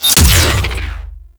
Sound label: Beam Cast; Warframe; Corpus; Corpus Railjack; Crp Admiral Agent